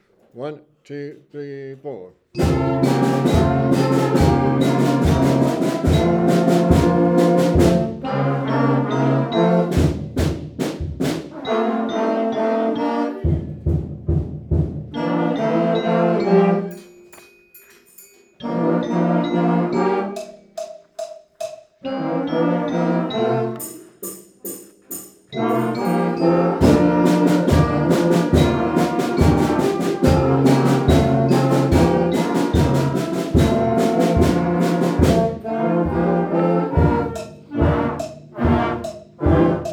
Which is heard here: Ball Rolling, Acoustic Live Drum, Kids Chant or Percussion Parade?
Percussion Parade